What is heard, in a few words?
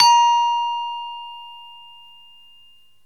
Gamelan balungan pelog